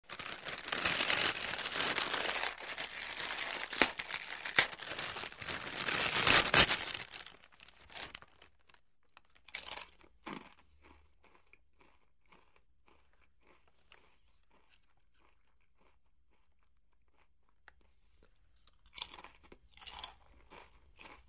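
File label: opening chips, crunching chips and chewing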